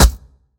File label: Aircrafts Engines Packard Merlin Prop Ex Sm Pop; DCS